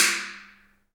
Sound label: Kenyan Drum; Roland LCDP14 Africa VOL-2; Af.Hand Drm1